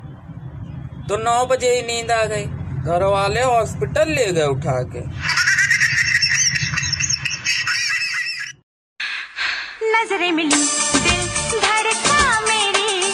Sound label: funny ringtone